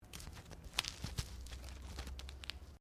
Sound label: Clothing Velcro Stick Long; Movemement sounds for various articles of clothing; Ripping; Objects